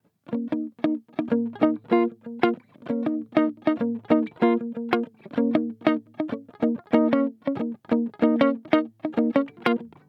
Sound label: multiphonics audio fx strange reflections dry; niall mccallum